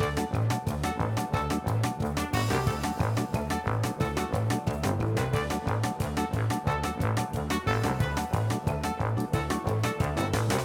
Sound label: free Spin Ambience; fruitshopmegaways